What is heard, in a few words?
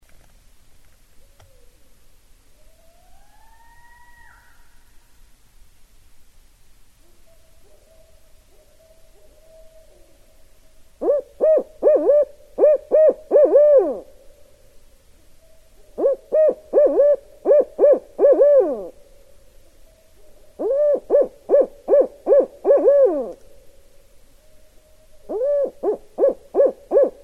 Barred Owl